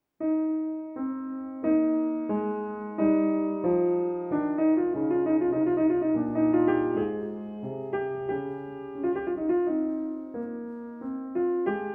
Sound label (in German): Klaviersonate c-moll Andante con Moto; Joseph Haydn